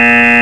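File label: electro buzzer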